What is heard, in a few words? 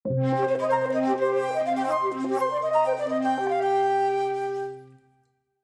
Pond Encore Remix, iOS 26 ringtones; Apple’s iOS 26